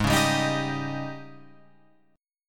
Ab Major 7th Suspended 4th Ab Guitar Open F; AbM7sus4 strummed

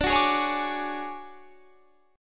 D Major 7th Flat 5th Guitar Standard, chord; strummed; JGuitar

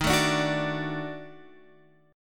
Eb Minor Major 7th Flat 5th Eb down Guitar Open F; EbmM7b5; strummed; JGuitar

